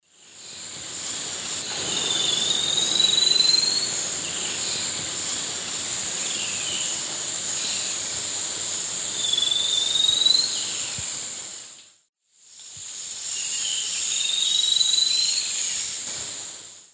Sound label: Ramphocaenus melanurus, song; S. America; Eastern Lowlands